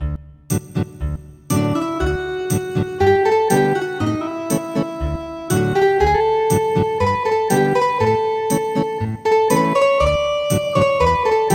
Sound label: Ethnic Guitar; Lullaby